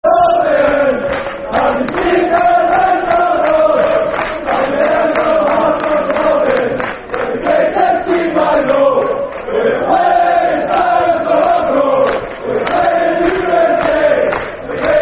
Have You Heard Of The Heart Of Midlothian Football Chant (Soccer Song)